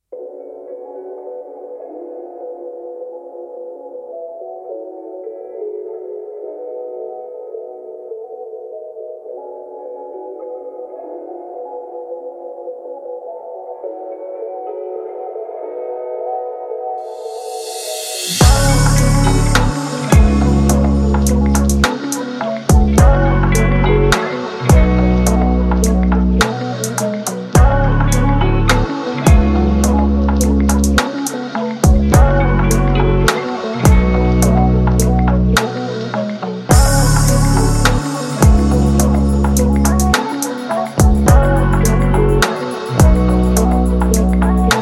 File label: RB BEAT FLOW